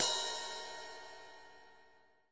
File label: real life RIDE, Real Life Kit; REAL LIVE KITS; Another Real Life Kit